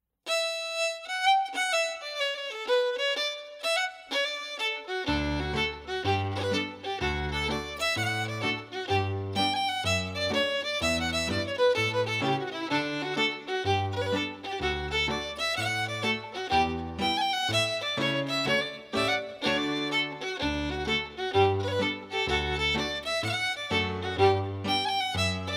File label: Clog Medium Tempo Full